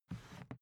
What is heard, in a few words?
briefcase plastic slide; industrie wirtschaft; bueroarbeit